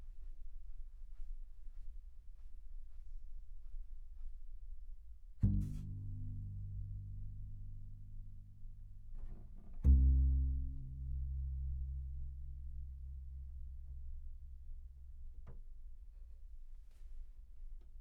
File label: CBass Bot